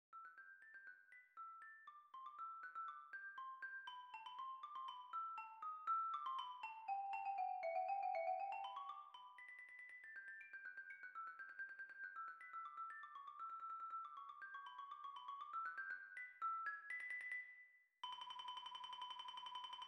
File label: mallet